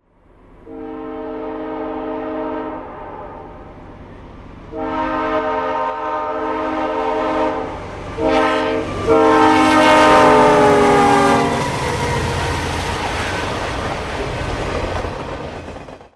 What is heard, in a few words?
horns; s3l; dash8